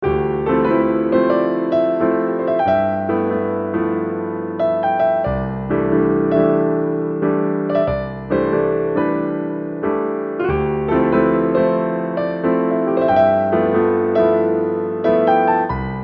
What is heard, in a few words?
piano; easyrpg; WitchsHeart